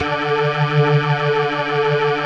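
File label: dance organ D2; Best Service; Advanced Media Trax Modern Composer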